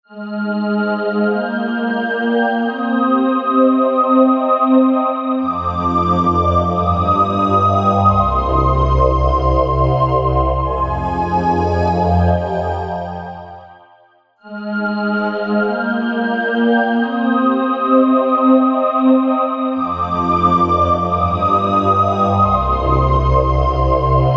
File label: ChorX Pad Pristine